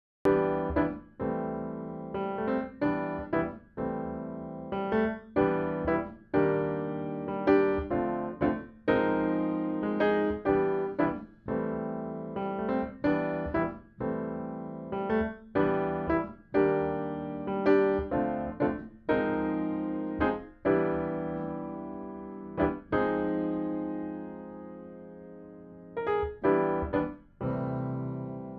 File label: Piano Ribbon